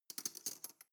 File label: cutlery teaspoon drawer place soft